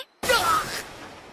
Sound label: Shots Fired